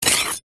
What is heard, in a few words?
knife scrape dirt